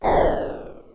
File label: Piranha Plant Fire Ball